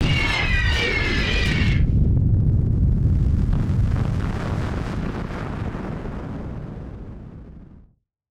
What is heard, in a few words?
Firework FX; musicradar; bomb factory